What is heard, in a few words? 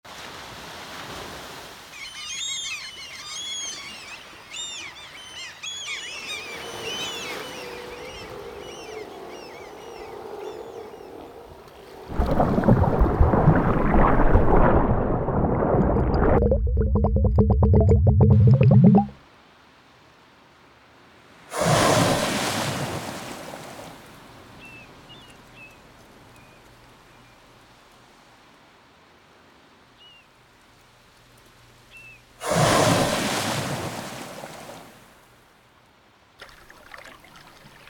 Soundscape